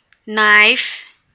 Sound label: knife